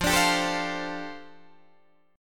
F 6th Add 9th F down Guitar Open D, chord

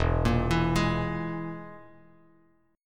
E Major Flat 5th E, Bass Standard; arpeggiated; JGuitar

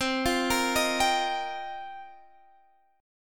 F 7th Suspended 2nd Suspended 4th

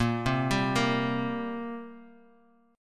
G Minor Double Flat 5th Bb chord

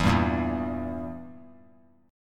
E Diminished down; Edim strummed; JGuitar

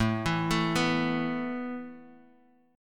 A Suspended 2nd down Guitar Open D

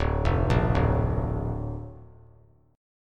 D Minor Sharp 5th D down; Dm#5 arpeggiated; JGuitar

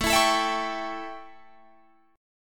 A 7th A, Guitar Drop D

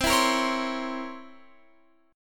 C 7th Flat 9th C Guitar Open F; C7b9 strummed

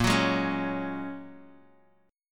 Bb Minor 7th Sharp 5th, Guitar, Open D; 8,9,6,7,x,x

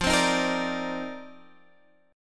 F 13th Suspended 4th; F13sus4; strummed

